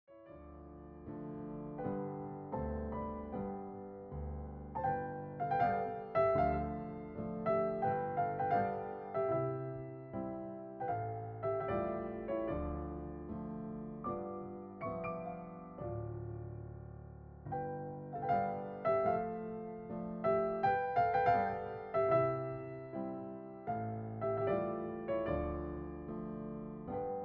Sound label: Hungarian Dance in D Minor- Poco andante; brahms; 21 hungarian dances; WoO 1